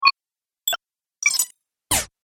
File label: Sci fi Activation Sounds; Science Fiction Sound Effects